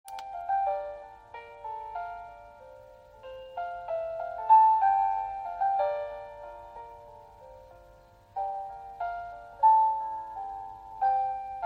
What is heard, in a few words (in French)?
musique